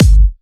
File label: Kicks; Vengeance Samples